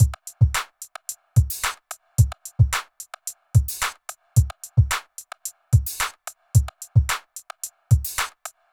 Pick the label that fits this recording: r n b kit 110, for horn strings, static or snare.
r n b kit 110